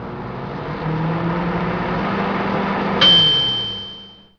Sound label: microwave